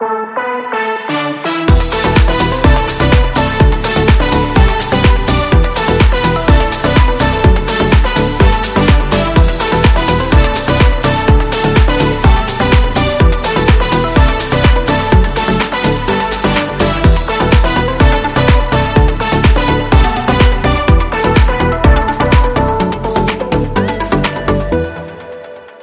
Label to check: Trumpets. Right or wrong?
Right